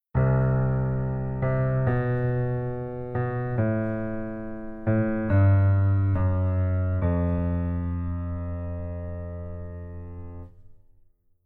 Keyboard

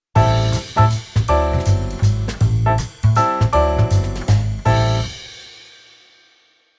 Keyboard Pf Jazz

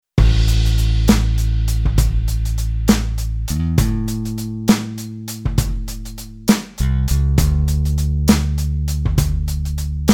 bass blues

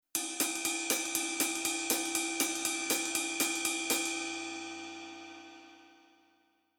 Rhythm Ride Push

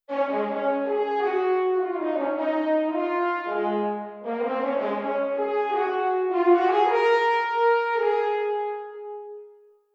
solo unison

